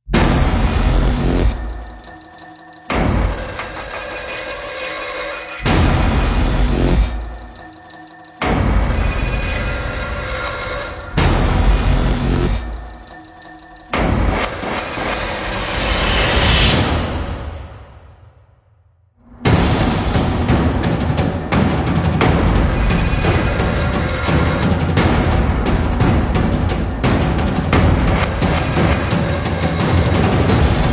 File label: Epic Speed Percussion; Gothic Storm Music